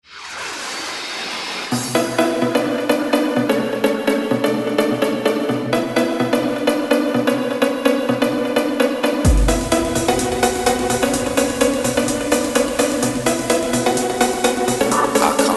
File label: win